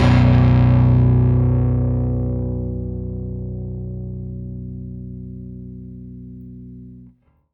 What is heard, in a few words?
Rickenbacker Bass; Airwindows; Rick-Xtras